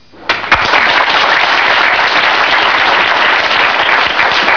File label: Clapping